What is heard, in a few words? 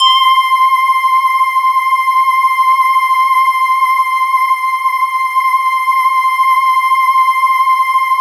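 BAMBOO VOC, Kurzweil K2000; BASIC-KW2000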